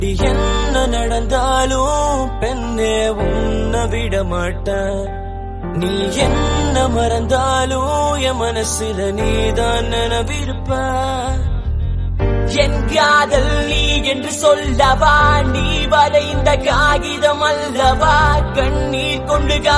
Love Rap

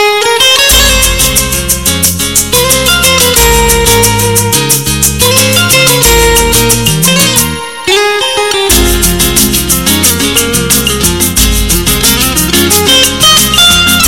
Sad Love Guitar Ringtone

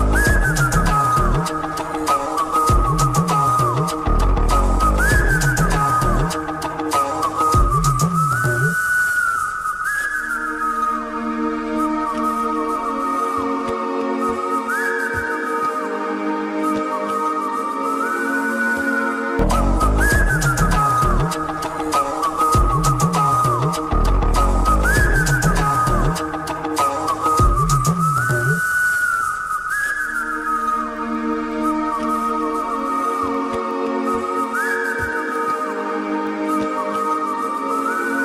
Whistle Ringtone; Vakeel Saab

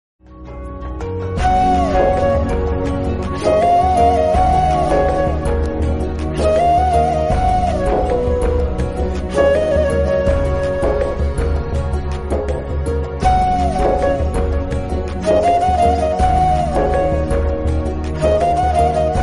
Flute Ringtone

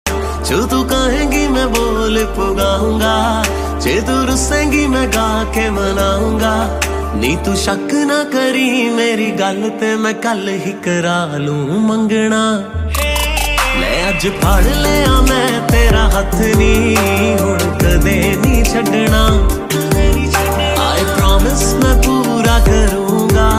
Romantic Punjabi Song Ringtone

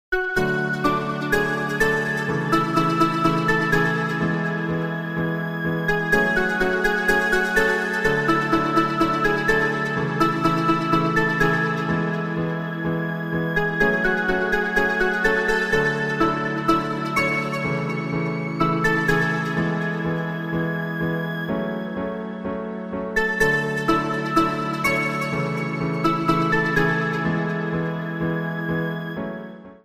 Instrumental Ringtone

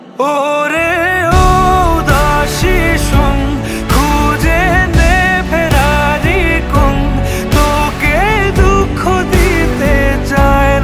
Bengali Song

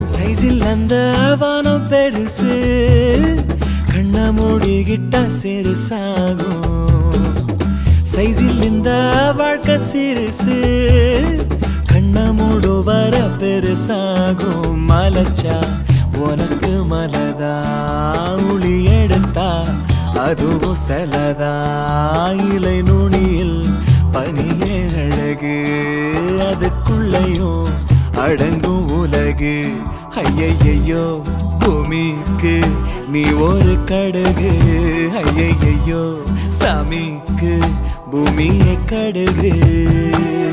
Tamil Ringtone

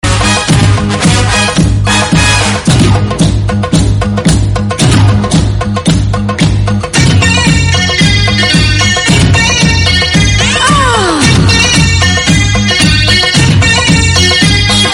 Instrumental Ringtone

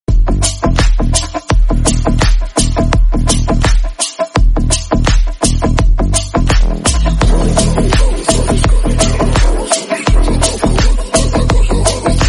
Ringtone

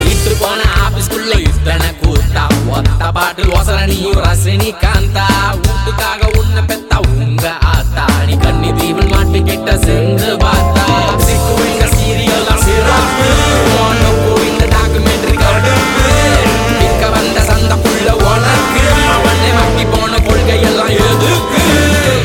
Tamil Movie Ringtone

